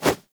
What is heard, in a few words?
Arm Swing Foley; Enemies; Warframe; Purgatory Warrior